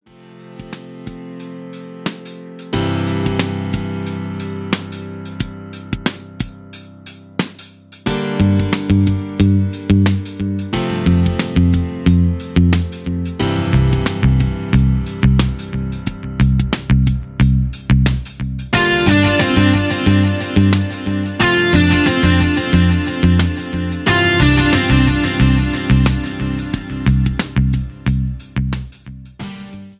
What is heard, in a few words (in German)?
musik hoerprobe